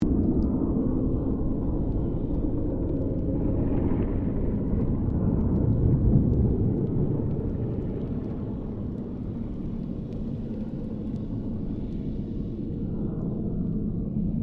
Boat Ferry Freshwater Class Engine Maneuvering Submerged; Sydney; Manly Ferry; Boats; Vehicles